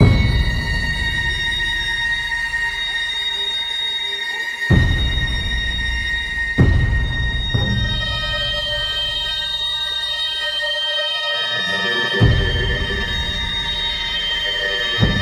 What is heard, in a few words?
Loop Tense; the walking dead michonne